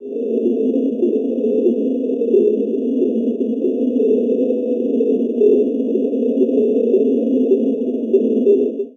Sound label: Crickets Low Pitched